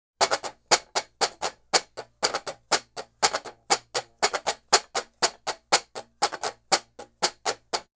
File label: Washboard, Appalachian; Sonic Foundry (Sony Creative Software); Continental Drift Aftershocks